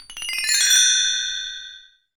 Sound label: BTREE, Bells Chimes; NorthStar - Global Instruments VOL-2